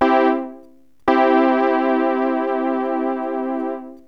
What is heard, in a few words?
MAJOR, SYNTH